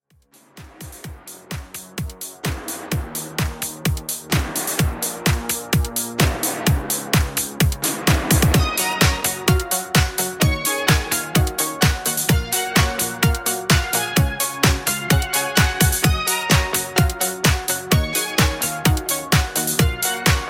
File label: 80s Classic Redrum 128Bpm